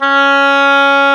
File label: oboe, Roland Orchestral Winds